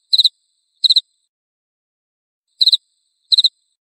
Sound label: Cricket